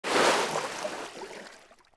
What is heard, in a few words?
Wtr Oars Up; Civ4